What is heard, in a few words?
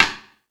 Percussion FX, Drum Machines